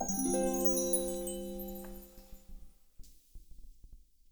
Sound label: Stinger Small; adam s venture origins